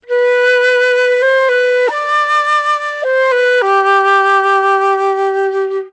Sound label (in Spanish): flauta; primartis; sonidos